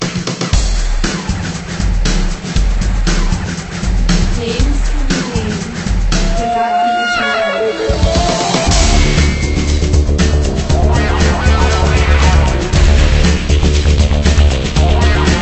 Menu Loop

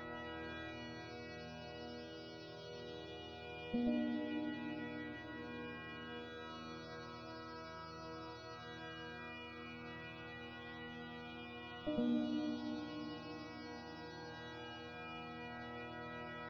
Melon Machine; gamerip; door to door

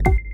click

